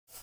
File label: Scroll; Samsung SCH-M710 T Omnia II